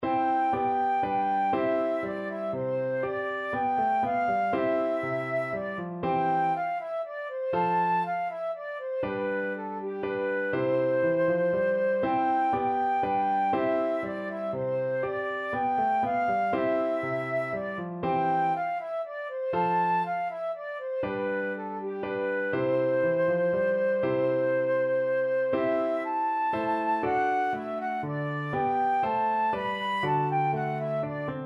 minuet, flute

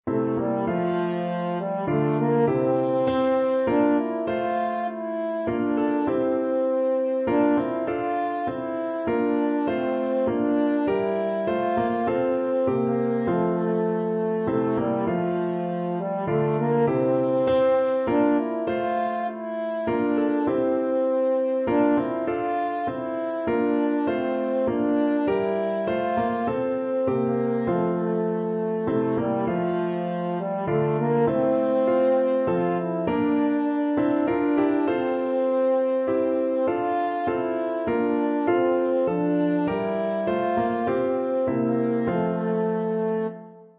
french horn

